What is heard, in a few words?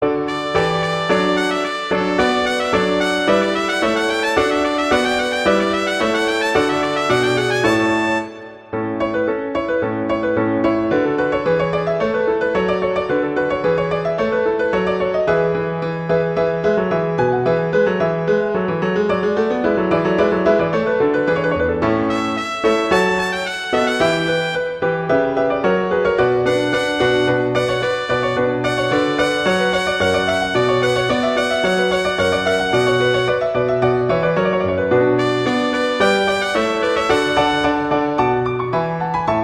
Allegro; Telemann